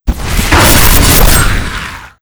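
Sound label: lava demon attack, monster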